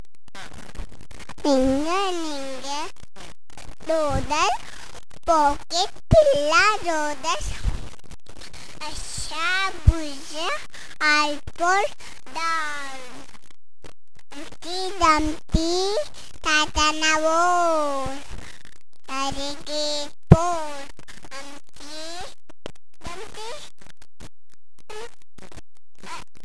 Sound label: Ring A Ring